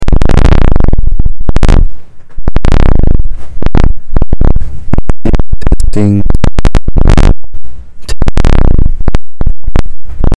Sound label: mic keeps making this thumping windy noise; brand new mic